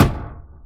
Hit

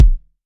Dnb kick

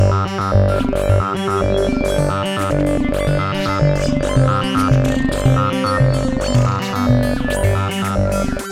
Cartoonish Loop

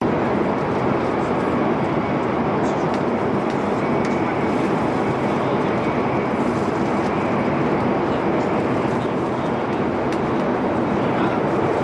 High Speed Train Interior Cabin China

